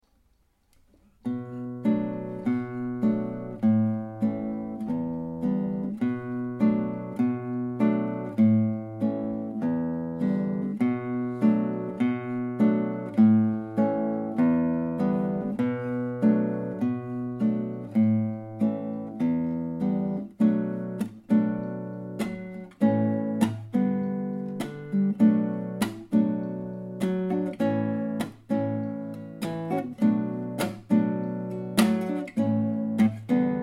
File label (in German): gitarre